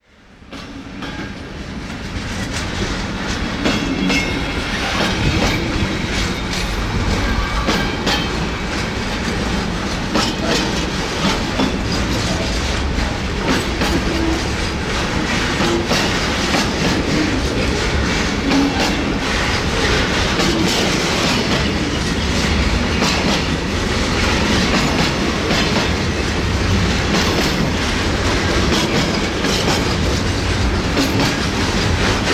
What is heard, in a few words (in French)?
bruit joints de rails; sonotheque